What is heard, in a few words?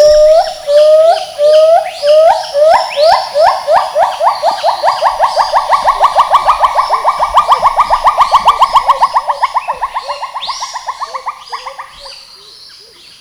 Gibbon clipped